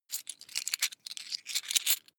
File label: coins big clatter